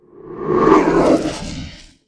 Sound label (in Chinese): 攻击, 鬼,幽灵; Enemy